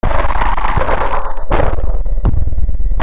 SCIFI

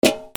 GARAGE

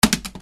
OFFICE

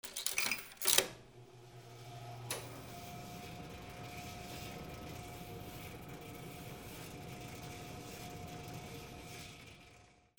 INDUSTRY

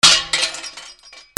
FALLING

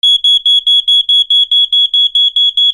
ALARMS

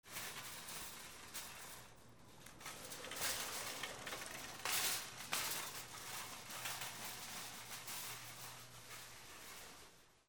AMBIENCE

